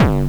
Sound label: bombo, kicks, Hardcore